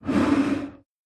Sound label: vox idle